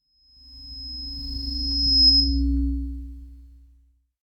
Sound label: Microphone sting comedy fail